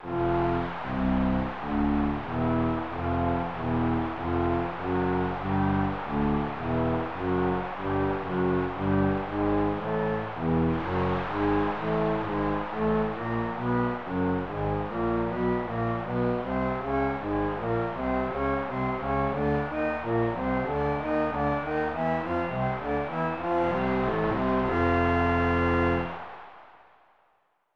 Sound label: Baseball Fanfare; Tecmo Super Baseball 1994 SNES